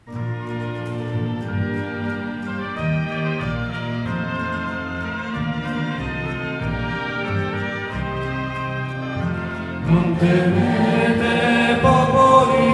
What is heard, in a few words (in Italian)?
Non temete popoli del mondo Soprani